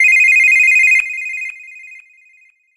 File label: Phone, ringtones; Coolpad; Note 3